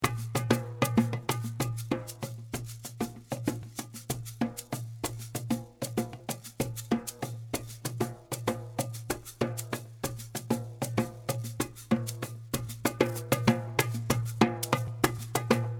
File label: Bonse Aba Accompaniment